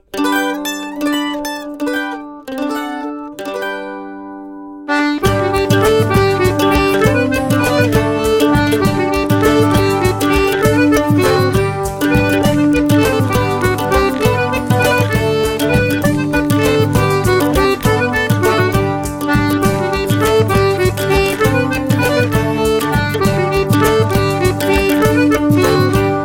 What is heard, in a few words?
Sea Folk; RuneScape